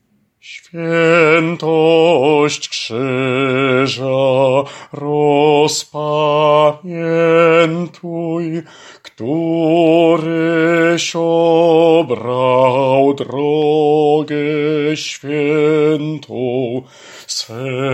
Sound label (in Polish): BAS; Swietosc krzyza